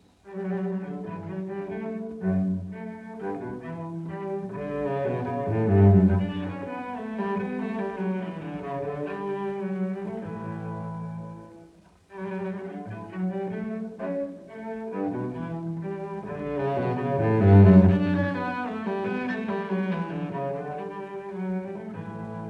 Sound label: Suites for Solo Cello NO.2 in D Minor VI. Menuet II; Live; Bach Suites for Solo Cello, Bvw 1007-1012 (Live)